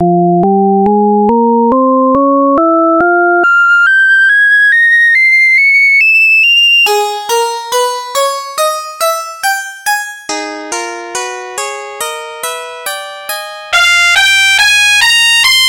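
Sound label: E Harmonic Minor Scale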